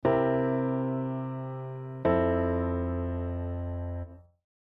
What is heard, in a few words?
C7 Fmaj7